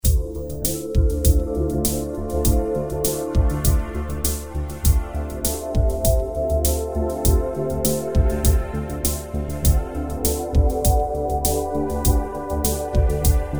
Soundtrack Demos Electronica Subtractor Pulsing Clouds and Mists